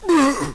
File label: zombie clasico pain; cstrike; zmod